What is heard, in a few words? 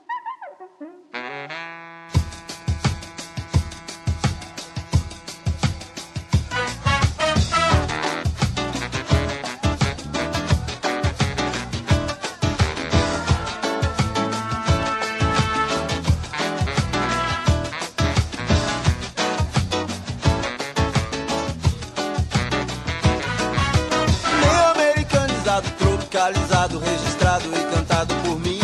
samba rock